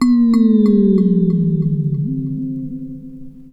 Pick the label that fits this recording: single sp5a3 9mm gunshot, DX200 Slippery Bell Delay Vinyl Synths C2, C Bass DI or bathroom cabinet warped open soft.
DX200 Slippery Bell Delay Vinyl Synths C2